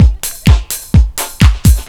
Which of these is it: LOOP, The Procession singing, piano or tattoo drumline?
LOOP